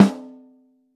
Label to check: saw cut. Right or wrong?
Wrong